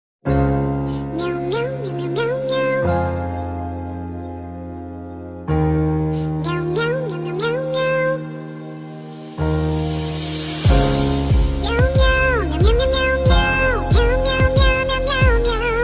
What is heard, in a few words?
cat stories bgm; background music